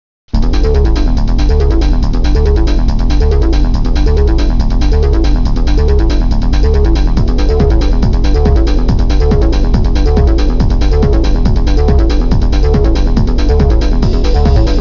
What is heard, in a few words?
music